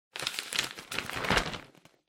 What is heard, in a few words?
Newspaper Open